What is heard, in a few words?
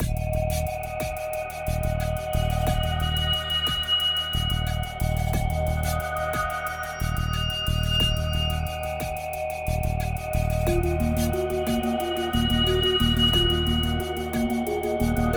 menu sci-fi